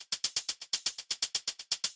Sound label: 122 BPM Battery Full Groove, full drum kit; MMB; Alchemy